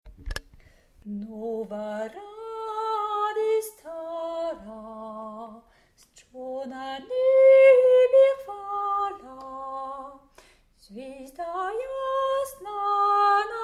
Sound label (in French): noel ukrainien basse